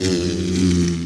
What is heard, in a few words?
Killer Bee Run